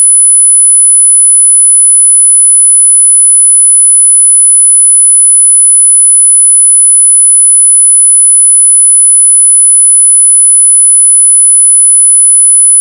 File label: Tone 10 Khz; 16bit